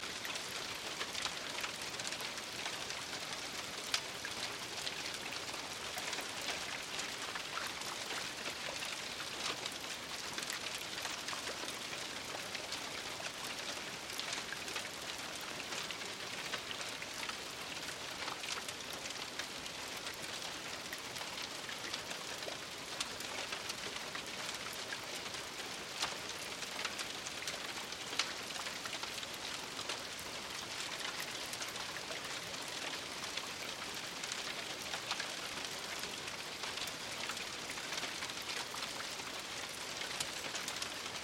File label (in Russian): priiatnye zvuki dozhdia